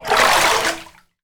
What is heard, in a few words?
Shallow Water